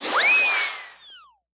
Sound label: escape; anemoi